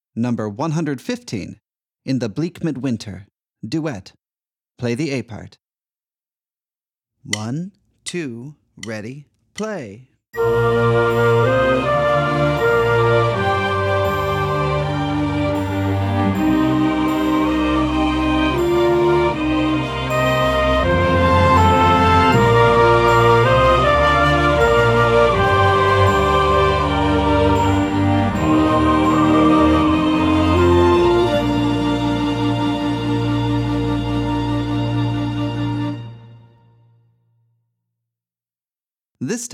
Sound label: Duet; In the Bleak Midwinter